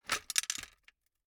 Gumball machine, metal, glass, candy, switch, mechanism, rotate, dropping, gritty, wide; Eiravaein Works; Vaeyan IV